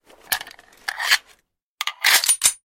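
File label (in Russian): окончание сборки пистолета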